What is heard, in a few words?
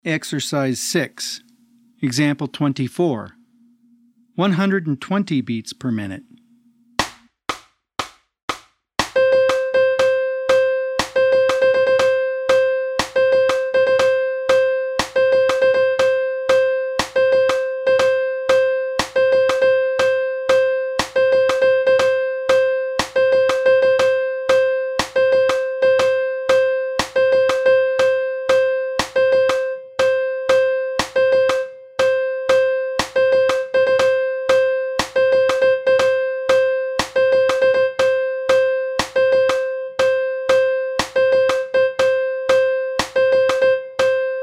120 BPM